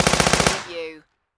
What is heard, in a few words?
Submachine Gun Heckler And Koch MP5 Long Burst Close Perspective